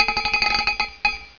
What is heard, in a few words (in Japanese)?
karakara